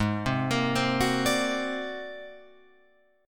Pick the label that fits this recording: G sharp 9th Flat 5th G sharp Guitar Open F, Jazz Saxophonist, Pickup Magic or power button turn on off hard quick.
G sharp 9th Flat 5th G sharp Guitar Open F